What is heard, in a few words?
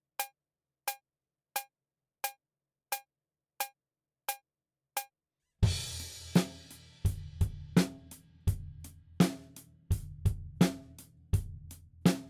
Drums ONLY; Pre Pro